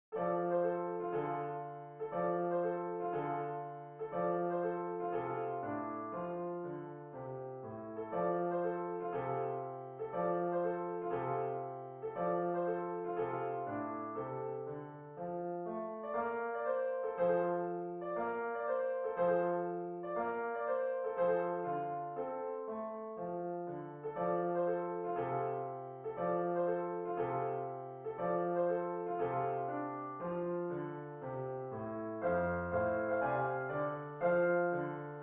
little blues piano

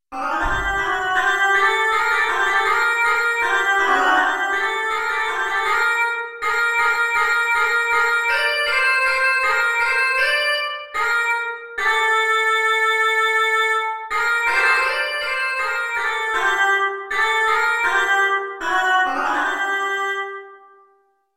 nous etions trois bergerettes cornemuse

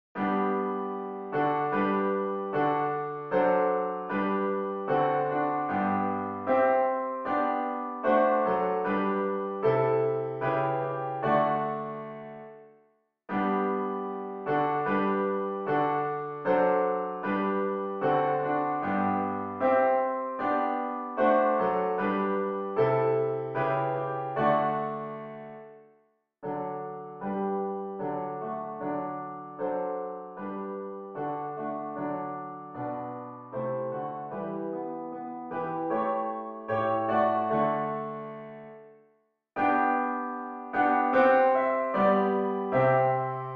hymne national allemand; haydn joseph

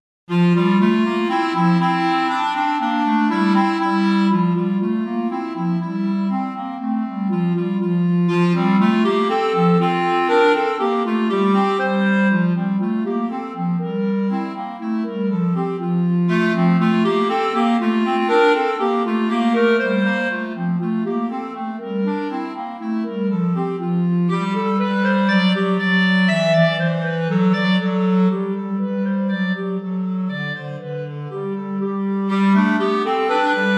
duo pour clarinettes; vignon denys

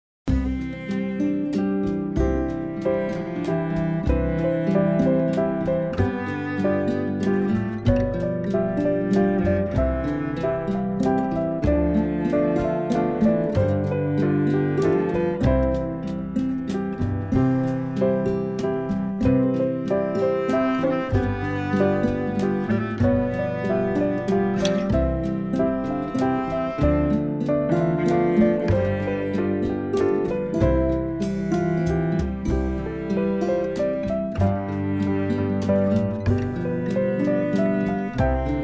danse allemande; beethoven ludwig van

